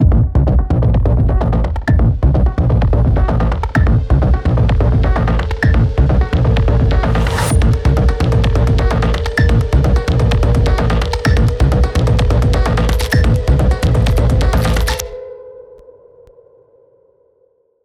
PVP Sudden Death

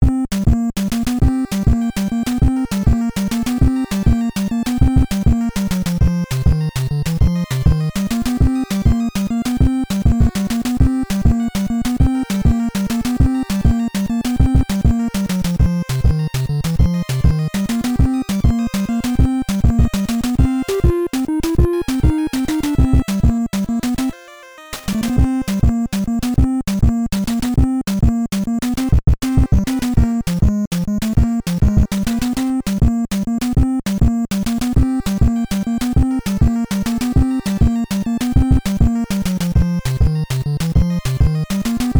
Boss Theme (Timer Low); nes; Mendel Palace

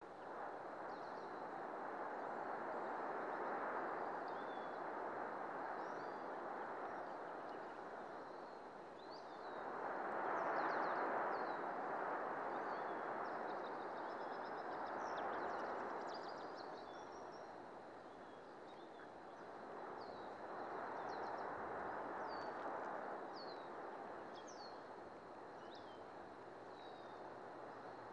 Bridge amb

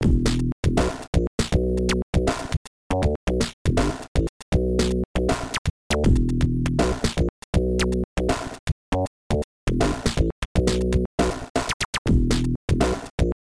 Jungle Performance (Solo); Tempo; 32X

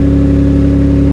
Bmw M2 Idle; car simulator 2

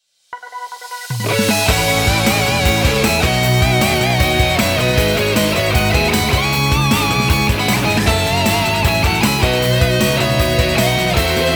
Instrumental-; Otome Riron to Sono Go no Shuuhen: Belle Epoque Complete Soundtrack

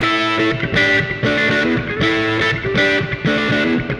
Guitar wet

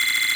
Game score screen Score Count Loop; angry birds rio

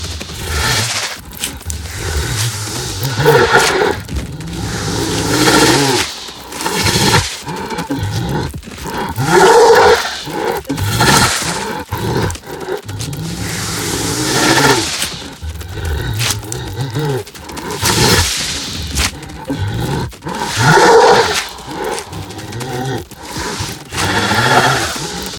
Chase Medium; Devour; The Inn; Zara

